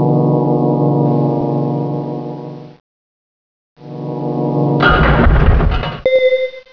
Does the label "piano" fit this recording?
No